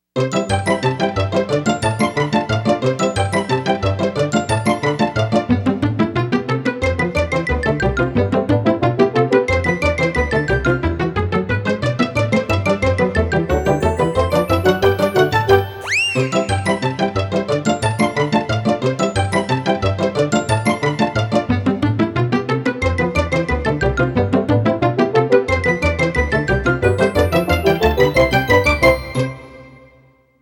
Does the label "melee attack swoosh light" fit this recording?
No